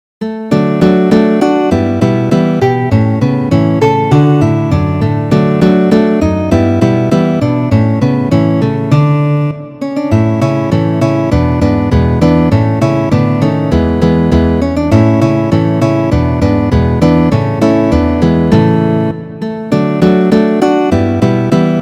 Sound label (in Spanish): contradanzas; Ferdinando Carulli